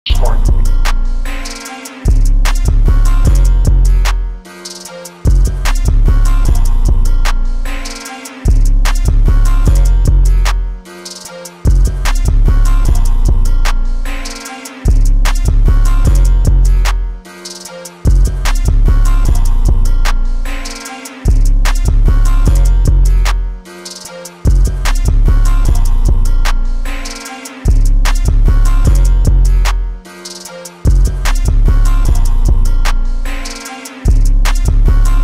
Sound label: Instrumental